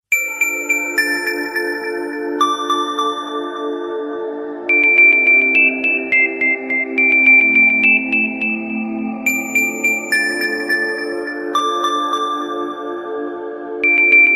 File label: Cool Tone